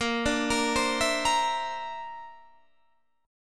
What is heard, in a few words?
D 7th Suspended 2nd Sharp 5th A sharp